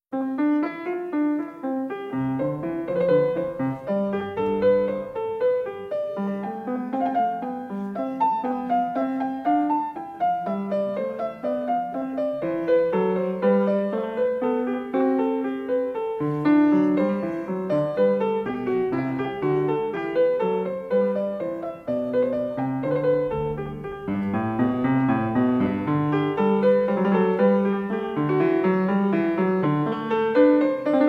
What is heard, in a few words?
Two part invention for keyboard No. 1 in C major, BWV 772; Klassika; Bach, Johann Sebastian